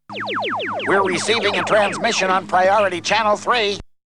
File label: Vocal; ZERO G - Datafile DISC 1